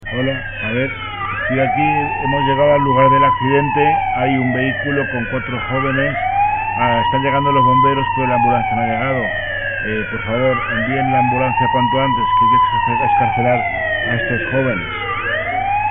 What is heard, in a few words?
Police siren